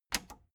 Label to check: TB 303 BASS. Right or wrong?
Wrong